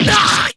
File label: damage; bb-kurogane